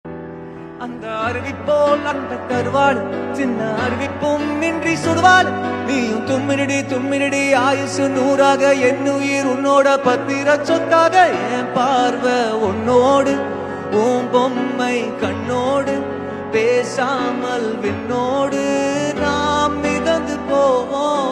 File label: singing song, telugu song; chinna movie